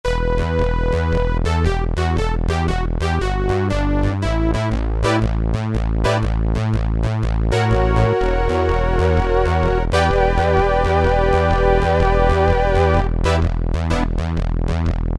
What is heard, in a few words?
Bass & Lead, Kurzweil K2000; Kurzweil K2000 Value Pack; Extra Programs